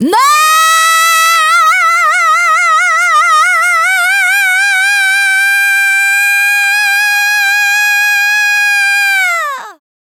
Dance Vocals, FEMALE

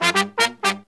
Pop & Funk Brass, 120

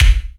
BD, Yamaha RY-10, Drums

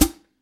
HH PD, Drum 1 Dry; East Collexion

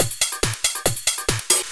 Beats, Synchro Funk; Tekbase; Sonic Foundry (Sony Creative Software)

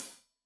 Hat Loose, Hi-Hat, Cymbals Stereo

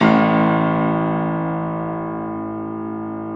Classical Piano; A-1; Best Service ProSamples vol.53 - Classical Prepared Pianos; Total Piano; Brilliand Classical Piano; Classical Piano mp +ped

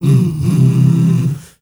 male hum, Heart of Africa; Spectrasonics

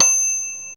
TING

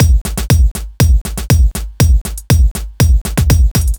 120 Sad Story Drums; Zero-G; Soundclash Nu Dance Electro; Acidized Wavs; Combi Loops; 120bpm

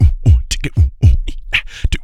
BOOGA BREATH, Vocal, Blues Soul; Spectrasonics

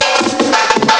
drum intro; yes; ST-XX Amiga Soundtracker Sample Packs

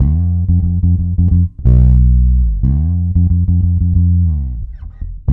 F, bass; Spectrasonics; Bass Legends; 90 S.BRONX

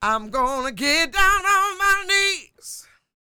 SING W, Vocal Planet Blues & Soul, BLUESY; ILIO

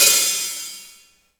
XV Hat O, Roland XV-5080

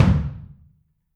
Kick, Drums Percussion; Kick 1 ModuIe

